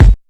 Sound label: raw kick sound f sharp key, Royality free steel kick drum sound tuned to the F# note